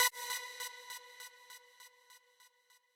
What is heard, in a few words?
lead